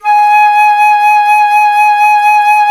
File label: FLT FLTG#, Flute; Miroslav Vitous - Solo Instruments VOL-2